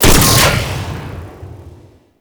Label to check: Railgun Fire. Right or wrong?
Right